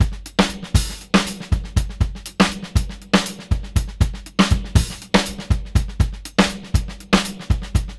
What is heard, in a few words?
dub 120, Beat Loops; Zero-G; Monster Beats